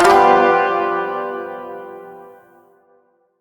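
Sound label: Shawzin Major Chord J; Chords; Warframe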